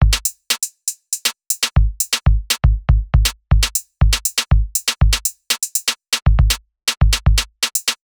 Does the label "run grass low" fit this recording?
No